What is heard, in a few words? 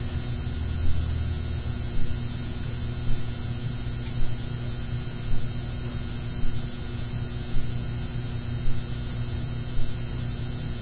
ambience; raycaster